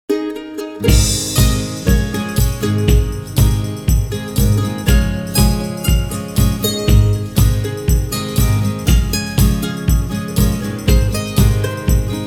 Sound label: CHARANGO STOMP